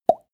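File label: plop water drop, body sounds; koerpergeraeusche; menschen; soundpool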